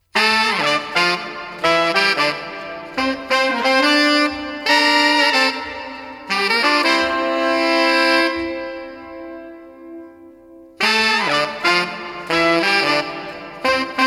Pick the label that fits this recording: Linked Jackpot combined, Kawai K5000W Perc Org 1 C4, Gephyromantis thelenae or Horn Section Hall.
Horn Section Hall